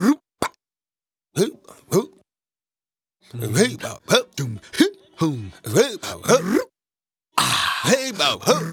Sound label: African Vocal Rhythm; Continental Drift Aftershocks; Vocals